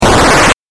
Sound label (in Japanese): 8bit 消滅